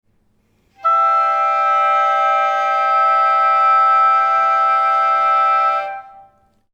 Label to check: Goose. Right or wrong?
Wrong